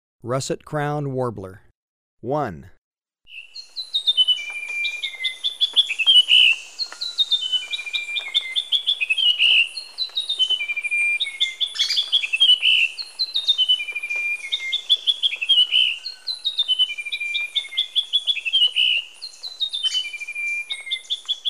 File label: Russet-crowned Warbler, Birds of Ecuador; S. America